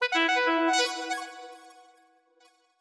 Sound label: Sax